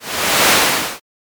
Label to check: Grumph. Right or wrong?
Wrong